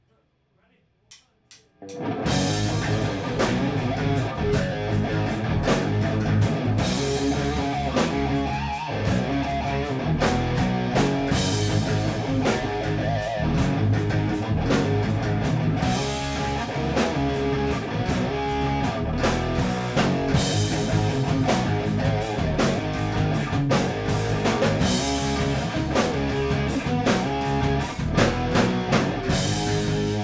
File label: Riff, rehearsals